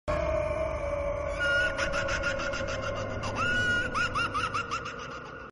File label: laugh; zig and sharko